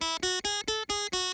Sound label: scale hi